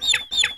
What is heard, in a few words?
chicken chick